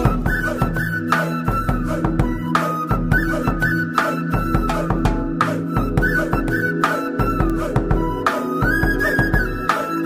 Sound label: Flute Tone; Kick 2; Telugu